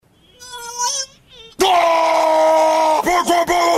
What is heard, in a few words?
Monkey Scream